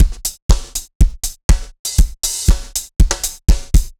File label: dance floor tight 120, loops; 120bpm